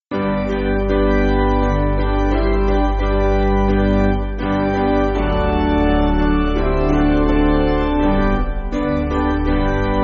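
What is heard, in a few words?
Piano, church backing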